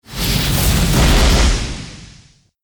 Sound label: attack; hero